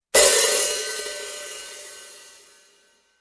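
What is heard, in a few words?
rock hihat 14 hit, cymbal; sabian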